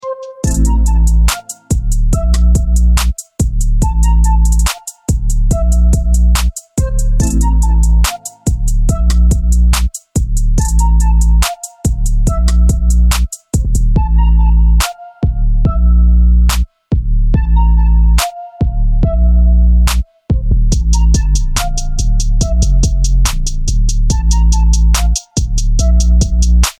instrumental ringtone

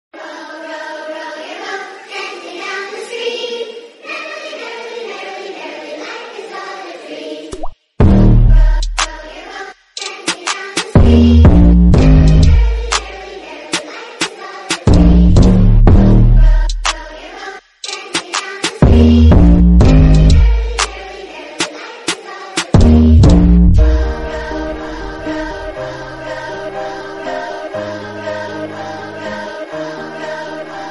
BOAT BEAT RINGTONE

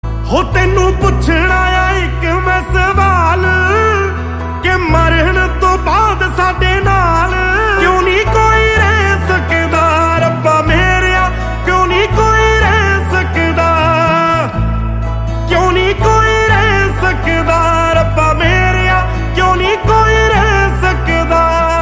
HINDI SONG RINGTONE

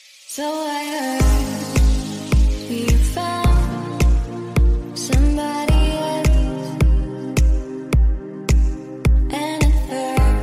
NEW TUNE ENGLISH RINGTONE

